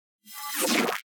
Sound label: UI Window MEDIUM Slide Metal CLOSED; Doex Studio; Qantum UI